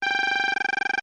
Computer Working, beeps & tones

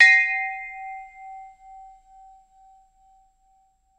Champagne Toast Ding, glass clinks

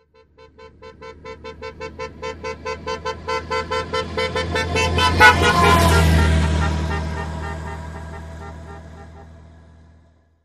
Bus By Coach Drive Bye

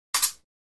Fencing; Swords Hit And Slides

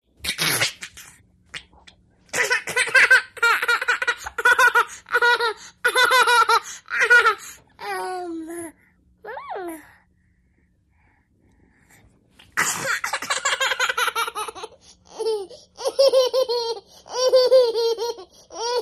Happy Baby Girl Laugh, eighteen month old

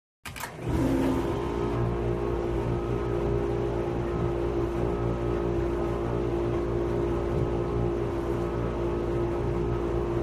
Dishwasher Steady

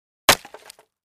Crate Palette Type 1 Hit with Sledge Hammer

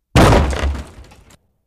Hockey Hit Boards

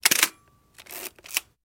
Shutter Click Hasse

